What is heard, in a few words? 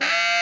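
Tenor growl F5, Saxophones; Greytsounds; Philip Wolfe David Crozier's Saxophones; TENOR SWITCH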